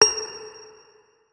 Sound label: notes, guitar; Hideout Furniture